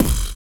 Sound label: SLOBBER; Spectrasonics; Planet